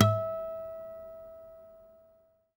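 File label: harmonic, Guitar; Quantum Leap; Guitar & Bass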